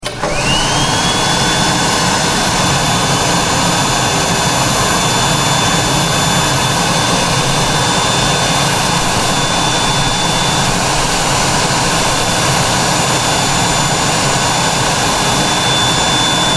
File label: Electric hand-drier in public toilet; Public Bathrooms; Offices